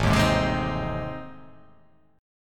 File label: A Minor Major 7th Flat 5th A